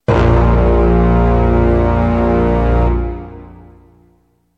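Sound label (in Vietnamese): Âm thanh mở đầu căng thẳng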